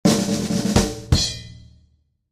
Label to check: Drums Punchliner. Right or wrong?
Right